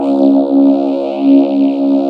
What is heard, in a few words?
VOCODER; VOCODERS; Sound & Vision - Gigapack I CD 2